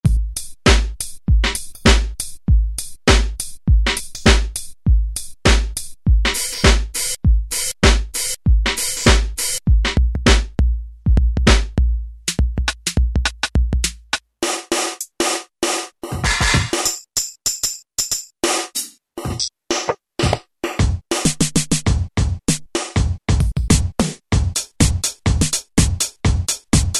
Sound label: Drum House, E-mu Morpheus; Factory Presets